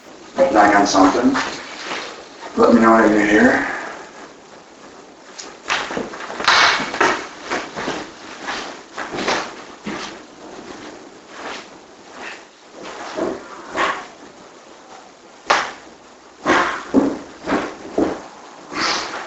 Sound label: unknown scream; Perry Schuch Hotel Saginaw, Mi; EVP